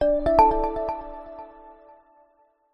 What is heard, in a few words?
multimedia alert positive bright; zapsplat